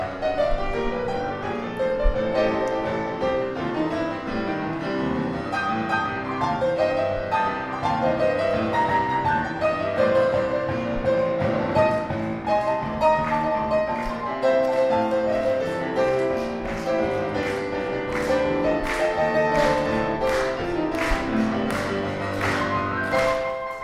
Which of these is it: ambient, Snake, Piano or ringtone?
Piano